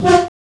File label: klaxon, Train; OpenBVE